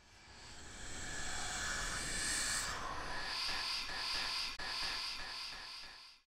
waves

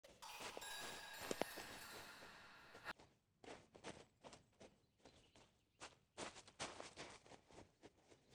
forest

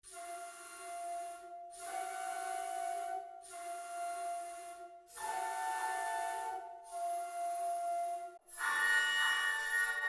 flutes

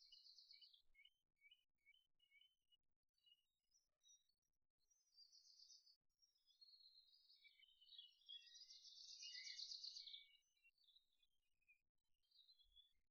birds

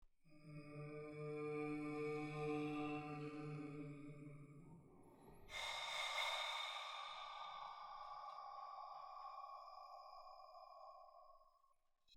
hum

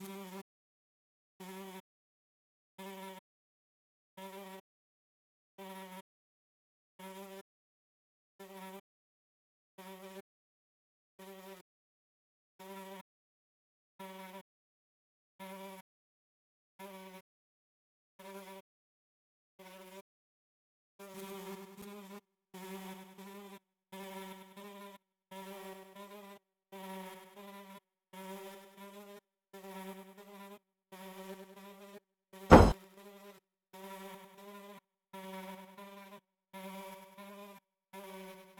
buzz

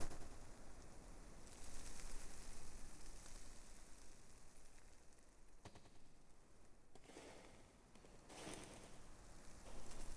forest

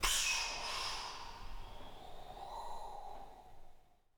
waves

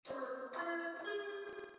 bugle

